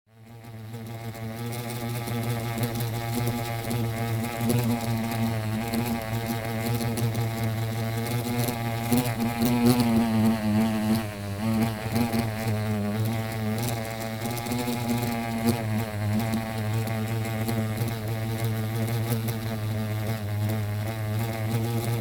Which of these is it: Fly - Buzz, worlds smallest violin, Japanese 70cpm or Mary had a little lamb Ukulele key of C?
Fly - Buzz